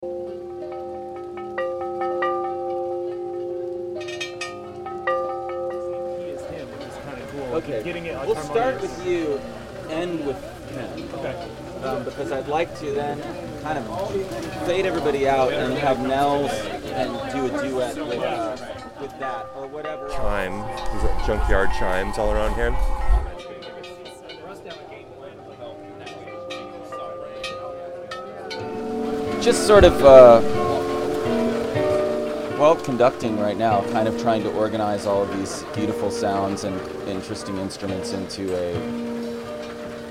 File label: Music Box